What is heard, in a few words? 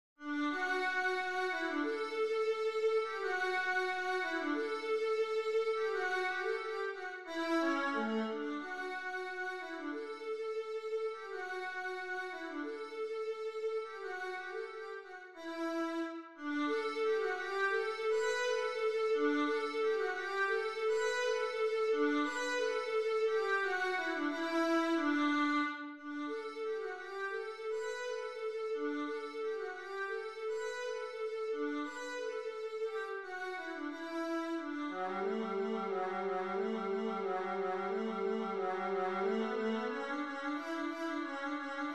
viola melody; fourseasons spring